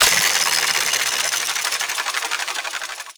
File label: Shotgun Reload Spin; Warframe; Zoboz Shotgun Skin